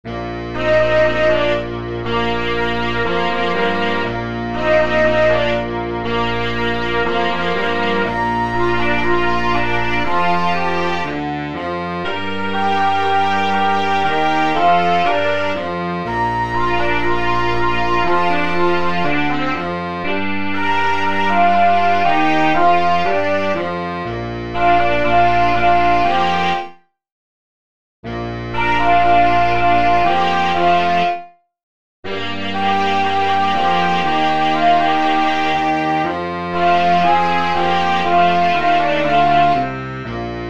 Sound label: sans clarinet